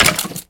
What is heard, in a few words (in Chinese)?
骷髅受伤声